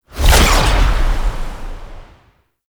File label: Sentient Dactolyst Kick; Warframe; Tau; Movement